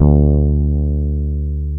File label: FRETLESS D2; AKAI S-Series CD-ROM Sound Library VOL-2; F-LES B1